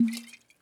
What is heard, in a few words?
Liquor Bottle Cork Open